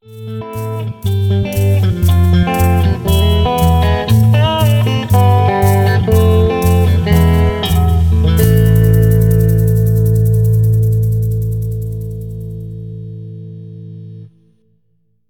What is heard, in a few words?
Music Light Stinger; starcraft 2 wings of liberty; MissionMusic